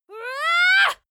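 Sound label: female attack ya long, screams; schreie; menschen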